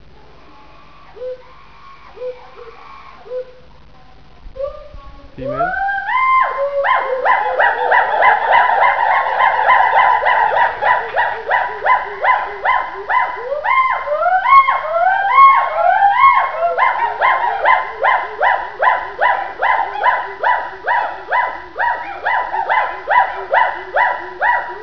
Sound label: Duett, gibbons; Group song, Kunming Zoo, China, 27 Juli 1990; subadult male; adult female; qunce consisting of female great call with overlapping male contributions (Kunming Zoo, China, 27 July 1990); "Jian-Jian"